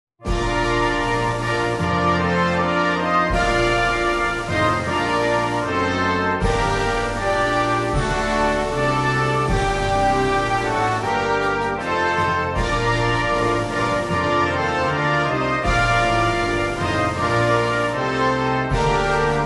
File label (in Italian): Inno nazionale Ucraino